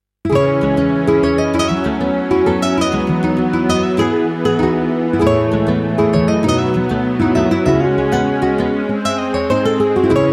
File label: Nylon; MidiGuitar